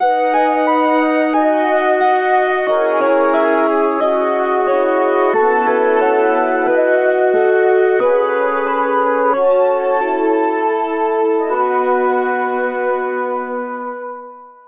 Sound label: December Jingle